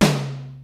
LIVE AC KIT, DRUMS; Kid Nepro; Elite

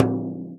FRAME, INDIAN PERC

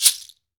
TANZANIAN, African Perc; E-MU Formula 4000 Series Vol. 4; Earth Tones

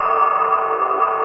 INDUSTRIAL, drum; Crypton - DrumBase5000; WS DRUMS

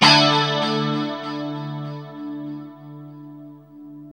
2CHD D 4 -L, Guitars; Spectrasonics; Hans Zimmer Guitars VOL-1; JC-SHEEN

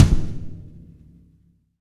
XV Kick, Roland XV-5080

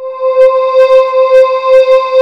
CHORAL PADS; Pro-Rec - Synthsonic; Z1 PADS

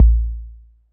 Drum Machines, Korg 01W; 300 Drum Machines; TotalKit

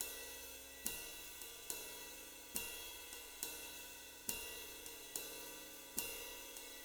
JZ70 SWING, Living Drums

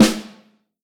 Vintage Snare Drum, 6.5X14; Q Up Arts; Johnny.C's Vintage Snare Drum; 6.5X14 LUD

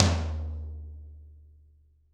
AMBIENCE TOM

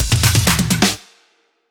Fills 128BPM, Clubsounds; Vengeance - Essential Clubsounds Vol.5

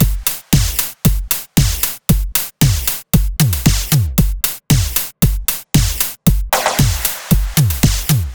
115 Beat; Brody; Zero-G - Future Wave

